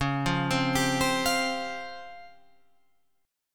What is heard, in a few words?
Db Major 7th Flat 5th Db down Guitar Open F; arpeggiated